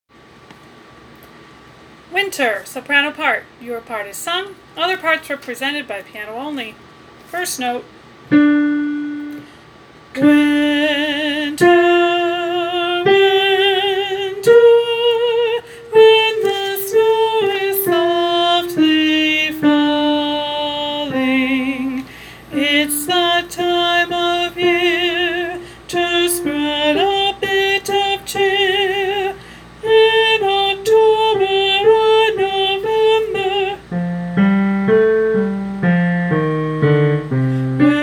Winter Soprano; Chorus Practice Recordings

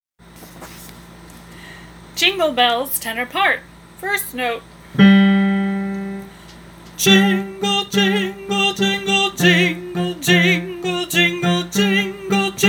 Jingle Bells Tenor; Chorus Practice Recordings

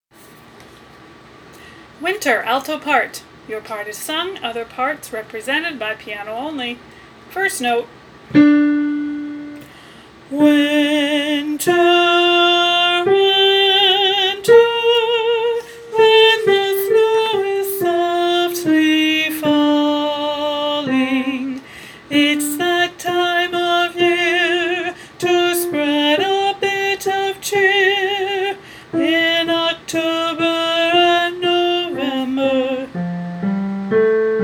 Alto, band practice; Chorus Practice Recordings

